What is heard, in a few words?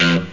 twang, guitar; OctaMED SoundStudio V1; jazzies samples